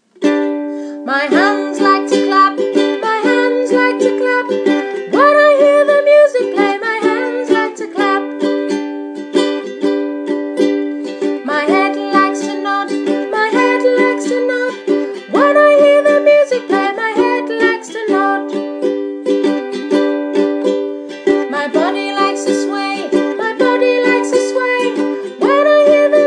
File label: My hands like to clap